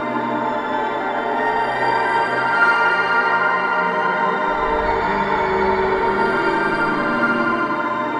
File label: Surreal Carousel, Guitartronics; Sonic Foundry (Sony Creative Software); Ma Ja Le Ethereal Textures